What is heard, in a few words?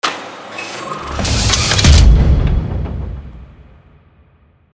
music logo